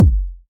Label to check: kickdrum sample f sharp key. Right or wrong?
Right